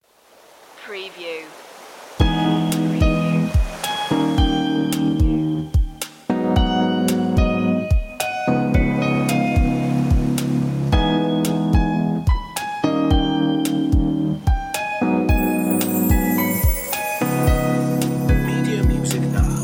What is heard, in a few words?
drifting away loop intro